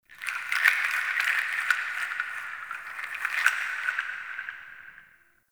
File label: Wood Chimes; Ambience; Warframe; Nokko Colony